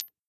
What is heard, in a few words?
tile land; Skillzgaming; gems odyssey 92